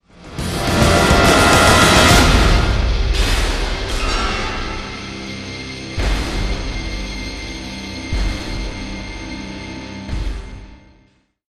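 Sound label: sting, full swell percussion trail; transformers war for cybertron gamerip; IACON IN RUINS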